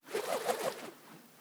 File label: Twirl Smol, organic wooshes; Micro Pack